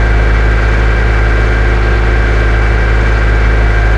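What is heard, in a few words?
chieftain engine interior rpm 66 load, tanks; engines; War Thunder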